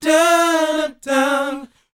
BOYZ, Vocal Planet, R&B & Dance; ILIO

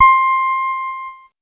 Casio VL1 Piano; Solo-Synths; Giga Samples Collection

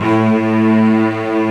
LO ST STRING

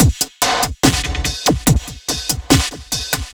Crunch, Drums Beats

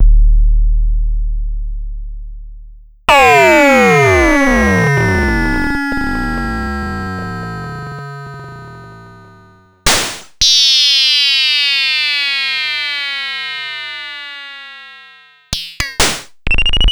Pollard Syndrum; m8

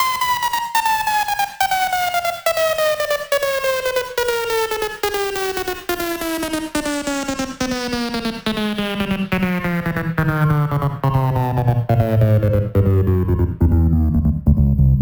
Sweeps Down

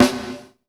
GATE GATED, PROC SNRS, Drumworks; Sampleheads; New York City Drumworks VOL-1

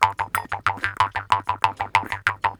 KHOMUS

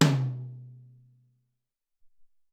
Big Tom H, Alesis SR-18

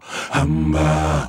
Male Voice, African Voices; Quazibeat; African Secret; Male Vocal

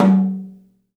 TOM, Studio Toms; 3D Percussives

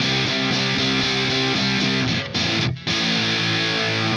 Guitar, Indie Rock; Sonic Foundry (Sony Creative Software); Indie Rock Anthems; Session 06 - Haze

